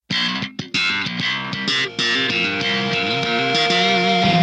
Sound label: Bass slap